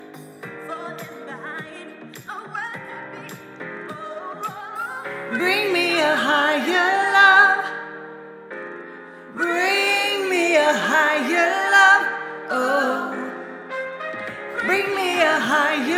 alto

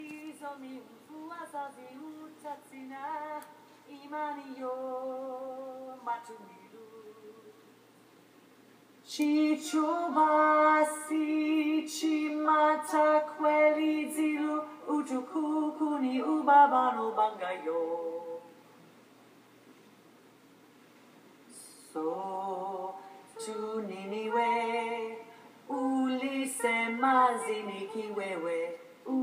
bolero alto theme coda

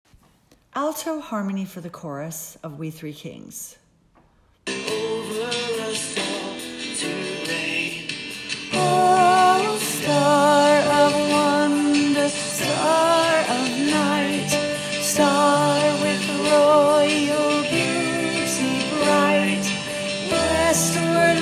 harmonies

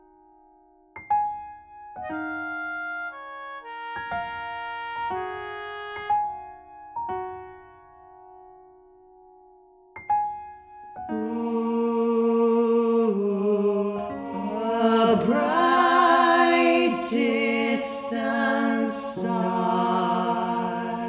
tenor

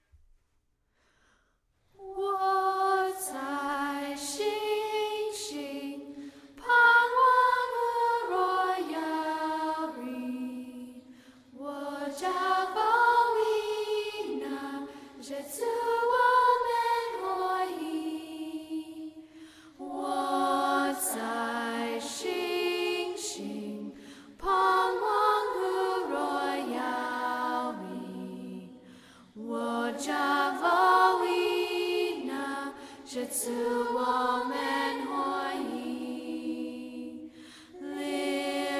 story alto

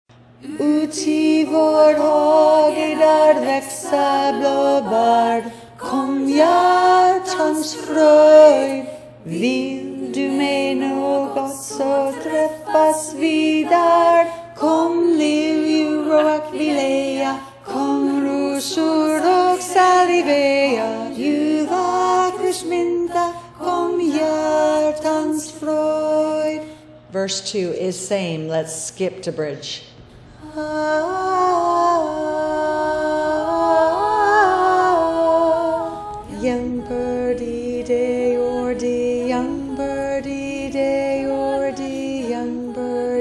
alto

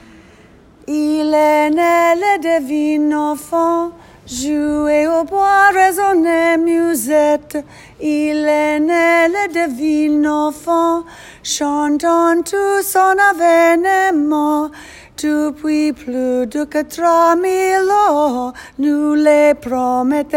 il est ne alto

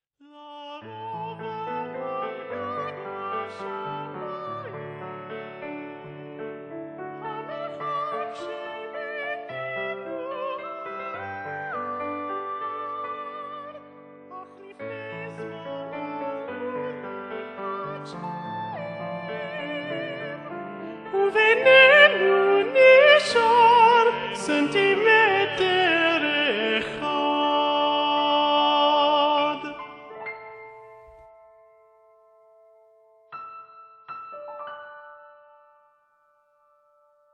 hebrew love songs, alto predominant

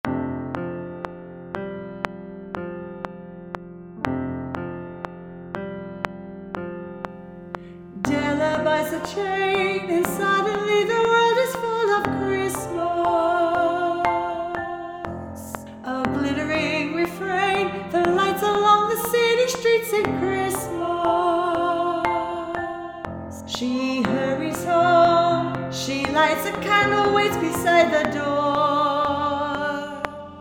alto

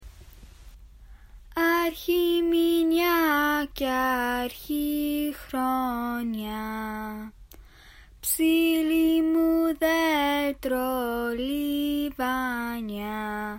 greek song

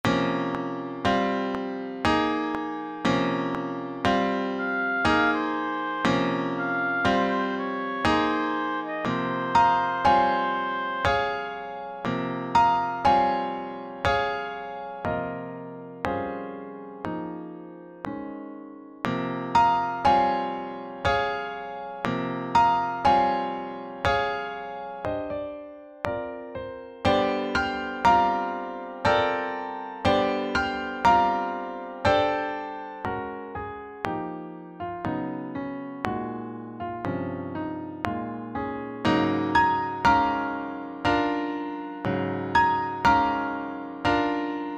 piano only

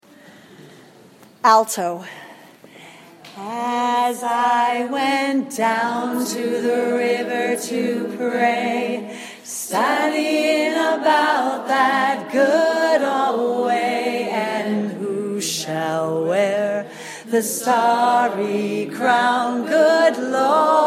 alto

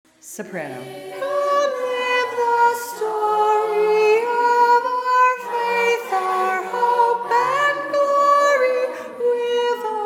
story soprano

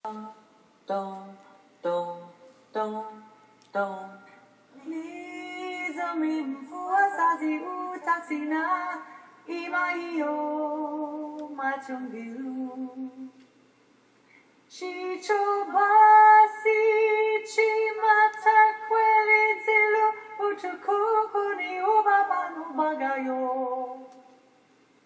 bolero alto theme

